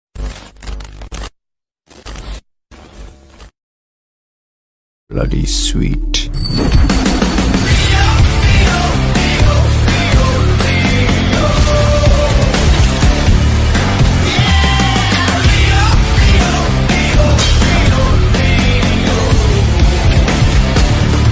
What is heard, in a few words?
Ringtone; Leo